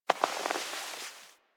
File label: Classroom Move Foley; Ambience; Warframe; The New War; Quests; Zariman